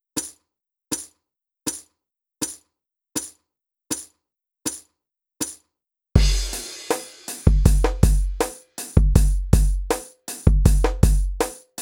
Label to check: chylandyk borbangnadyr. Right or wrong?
Wrong